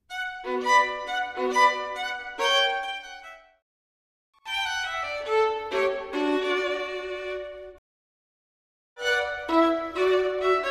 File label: Partita for violin solo Tempo di borea